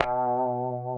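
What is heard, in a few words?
B 2B, compressed Strat, guitars; Spectrasonics; Hans Zimmer Guitars VOL-1; 90's Sample CDs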